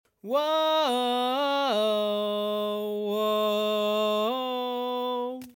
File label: Lead, barbershop tags